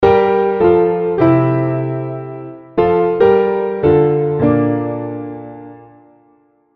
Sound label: Grandpiano; Nexus 3 Factory Nexus